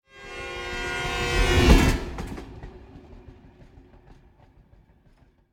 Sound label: Train Whistle By European - L to R; Hollywood Edge; sound designer's toolkit v2